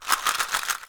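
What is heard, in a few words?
SHARK CALL, ethnic percussion; WorldSounds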